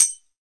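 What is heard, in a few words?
TAMBOURINE, R-8 DRUMS; EdgeSounds; Drum Mashines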